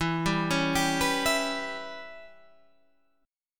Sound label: E Minor 6th chord